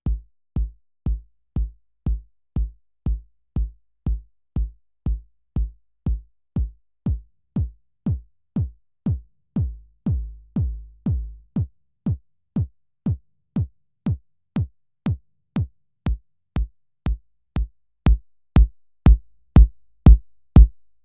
KICK

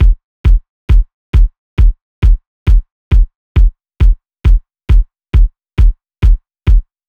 Kick Layer

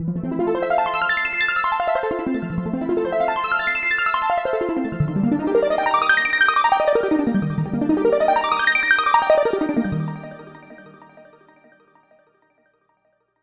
Plucky Arps

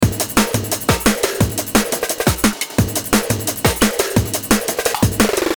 Beat

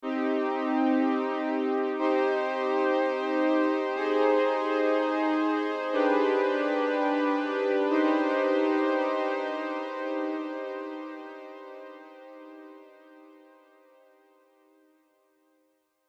Legato Pad

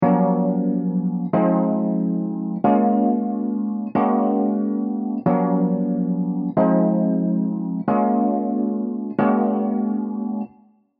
Rhodes

